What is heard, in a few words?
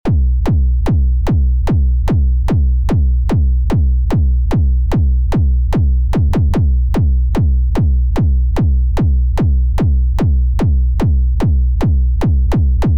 Kill The Bass Dry; distoblast